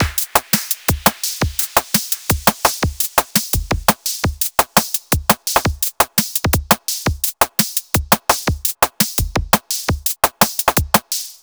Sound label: Drum Patches; Primer